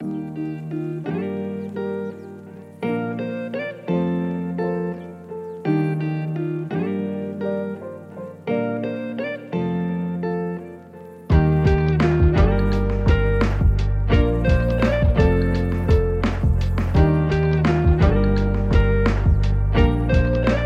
guitar instrumental

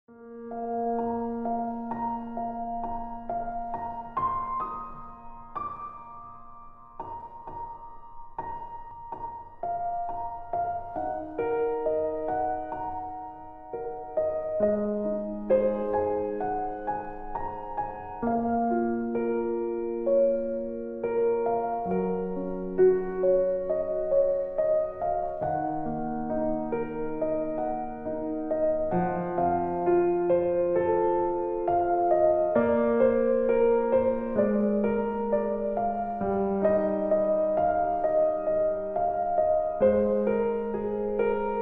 Peaceful Piano

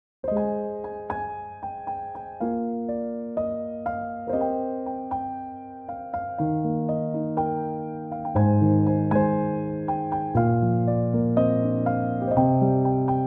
chill piano instrumental

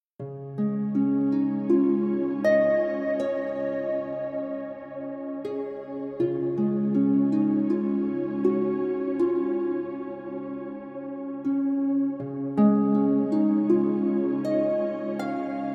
Prayer Pray - Harp of Ambience; hotusa spa

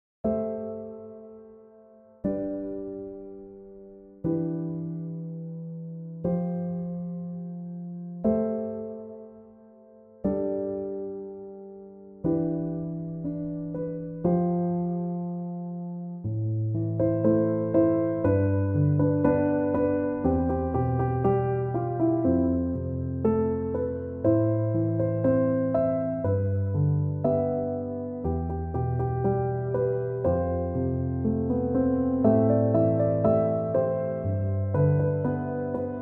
chill piano instrumental

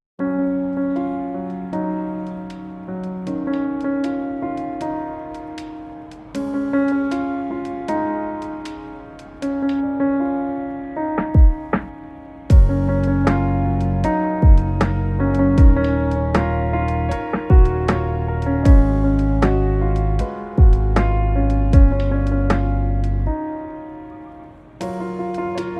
instrumental lofi

